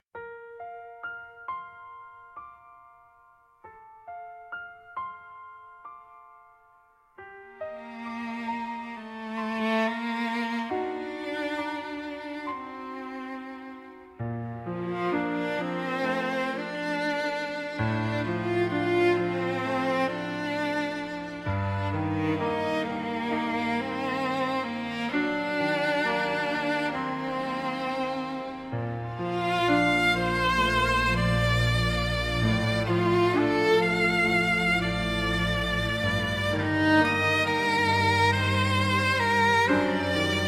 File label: inspiring piano strings for story climax